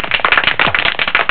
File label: cleared ship; ParadroidII